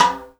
Rim Rimshot; Death Metal

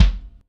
Kick Death Metal

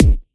Kick Dnb

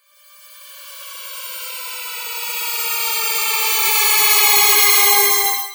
Riser Space Ship

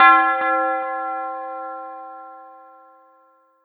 Bell Church

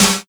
Snare Jungle; Alexiero1; pitchglitch

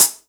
HiHat Closed; Colombo Acoustic

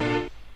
synth; foxdot default; Apex & Motivation Synth 1

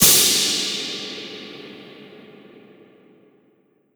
Cymbal FX Long; Singlecoil; pitchglitch

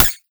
Hi Tech Drum Snare

